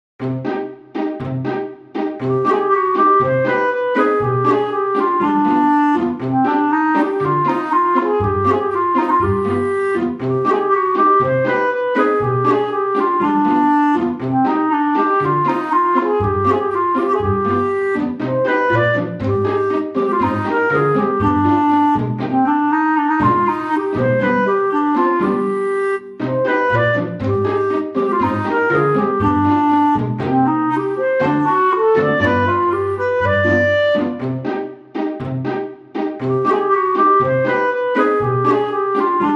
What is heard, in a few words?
comical blunder